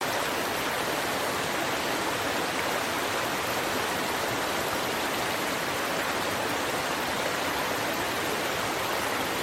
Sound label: waterfall